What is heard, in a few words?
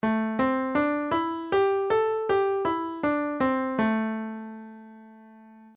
minor pentatonic scale